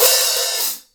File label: HH OP, Drum Dry; East Collexion; Drum 1